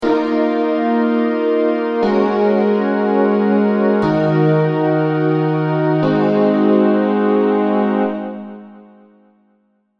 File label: vital presets; chiqui audio; vital presets pack